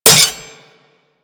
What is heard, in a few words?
Dagger Metal Impact; Dual Daggers; Hit Effects; Weapons